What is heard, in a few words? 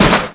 explos; AB3DII